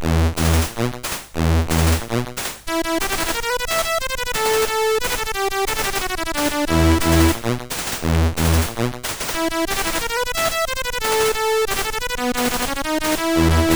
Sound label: Dub Mix CSG8580R5